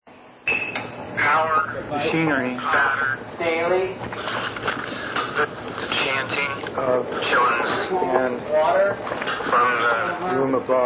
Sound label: Hiss Lift